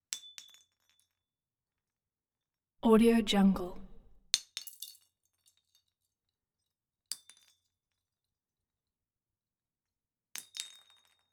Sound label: light bulb stem bounce cement